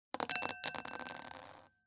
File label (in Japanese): チンチロ サイコロを振る; 場面切り替えや心理描写; 演出効果音